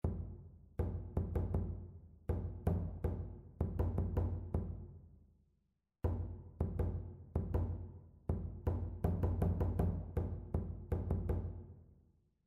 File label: rhythmic error detection; ear training